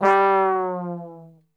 G 1 TRBL OF, Brass; Best Service; Brass Super Section; 90 s Sample CDs

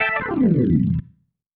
Slide, Organ, Loops; Sonic Foundry (Sony Creative Software); Joe Vitale Organ Donor; Slides

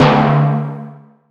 Big Conga

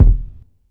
Lo-Fi Shy Kick; DRUNK DOG

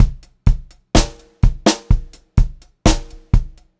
Pop-Rock Drumloops; 126 POP 4

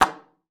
SLPB D#3, ALTOSAX; Xsample VOL-2

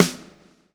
lash, backbeat

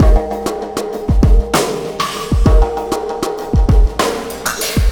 Sky Lab Beat, Processed Drumkits

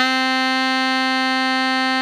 baritone FF C 3, Sax; Giga Samples Collection

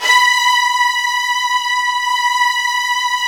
HDS B3, Virtuoso Strings; ILIO; Kirk Hunter Virtuoso Strings VOL-1

